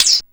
KLIK BEEP; ELECTRIC-SET

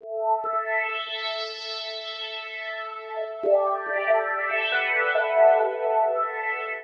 SPACE SYNTH 140 A; SpaceSounds

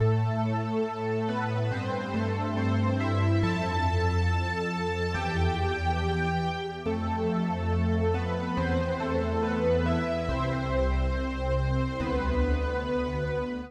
SPACE PAD 140 A; SpaceSounds

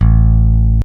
FINGER A-2, ELECTRIC BASS

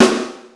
SD HOHL, snare drum; SNAREDRUMS

